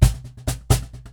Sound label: Cajon Fill; musicradar